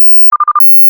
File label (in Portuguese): Soltar o Botão PTT; area tecnica